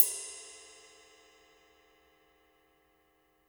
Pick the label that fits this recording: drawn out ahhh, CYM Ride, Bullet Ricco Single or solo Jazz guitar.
CYM Ride